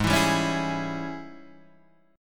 G sharp Major 7th Suspended 4th Sharp 5th, Guitar, Standard; 4,7,5,6,5,4; JGuitar